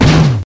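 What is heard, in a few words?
explosion; gusanos promode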